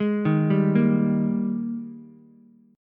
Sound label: G sharp Major 7th Suspended 2nd, Ukulele; arpeggiated; chord; 1,3,3,1